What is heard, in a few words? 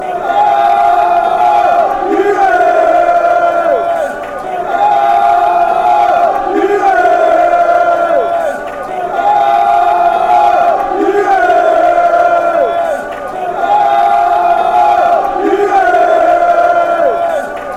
come on you reds, chants